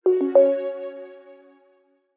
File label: chime